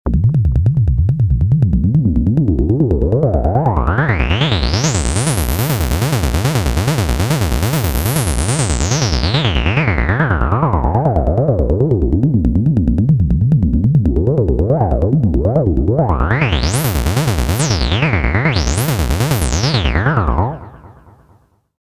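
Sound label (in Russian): синтезатор электронный ритм 2; sintezator-elektronnyj-ritm-2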